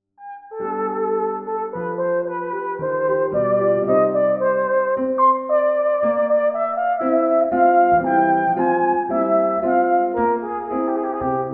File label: Courante